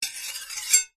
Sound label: warfare knife blade scrape draw metal